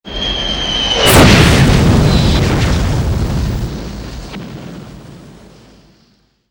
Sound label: war zone big explosion